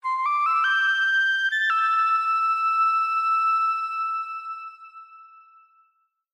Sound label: playing flute; koryuta's flute